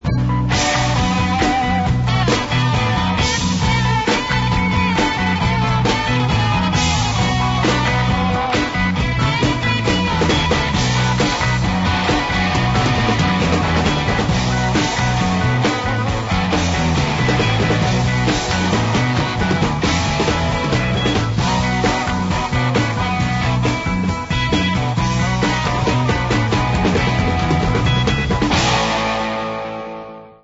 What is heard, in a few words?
guitar